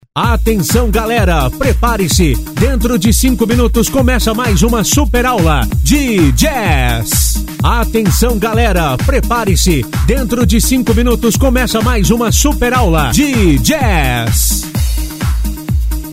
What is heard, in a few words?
jazz